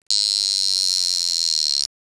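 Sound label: Cricket, Alarm watch; Ararm watch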